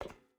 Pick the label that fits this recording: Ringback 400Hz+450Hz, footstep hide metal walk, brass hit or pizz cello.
footstep hide metal walk